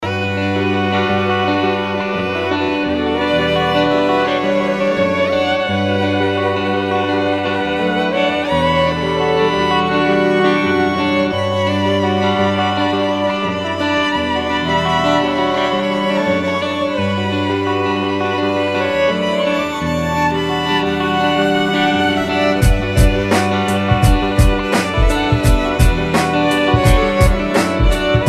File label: Sombre Violin and Guitar